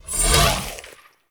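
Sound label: Launch Shroom Projectile Whiz By; Warframe; Nokko; Launch Mushroom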